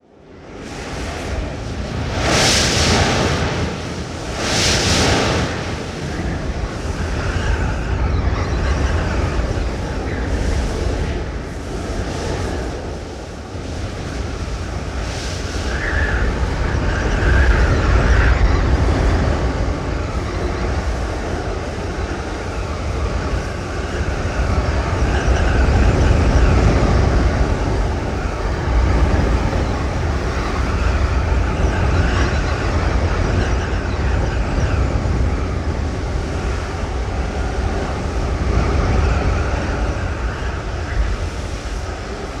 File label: Violent Rustling Wind; Sony Pictures Sound Effects Series Volumes 1-10; Sony Creative Software